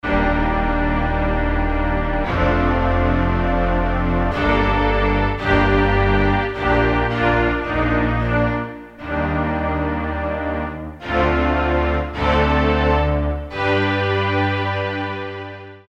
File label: mixed strings, Korg DSS-1